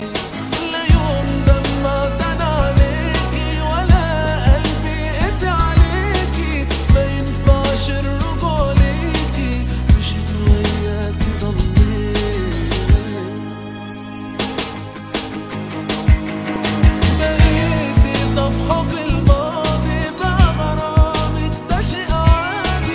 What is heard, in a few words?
Arabic music